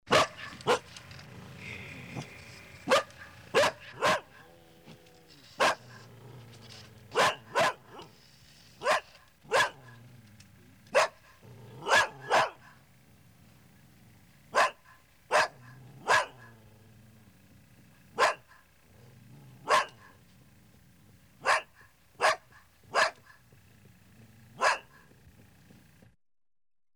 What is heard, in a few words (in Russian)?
Лай собаки; Звуковое лото